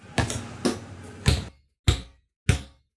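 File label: basketball cool beat ball bounce; hoops drums; drum kit; nova sound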